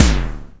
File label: PERC FX, SYNTH PER